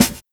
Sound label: noisy acoustic snare b key; snare drum samples